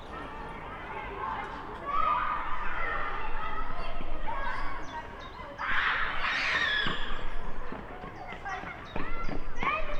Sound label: screams